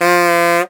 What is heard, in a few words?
Comedy High Honk